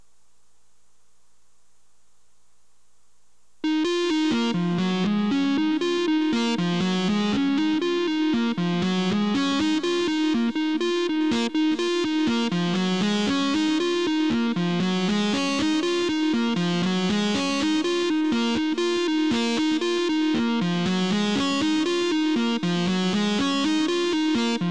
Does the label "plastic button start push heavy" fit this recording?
No